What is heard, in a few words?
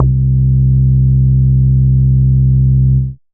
Moog Bass, Dance Synths; Best Service ProSamples vol.37